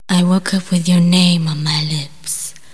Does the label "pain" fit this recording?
Yes